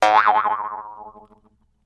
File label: Jews Harp Vibrato, boings